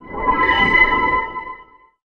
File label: heal spell; allacrost-0.2.1